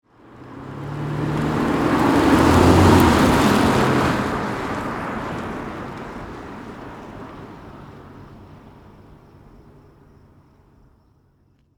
Transportation, Automobile, Sports, Car, Aston, Martin, Db9, Drive, By, Slow; Car Sound Effects